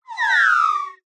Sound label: cartoon slide whistle reversed chorus delay funny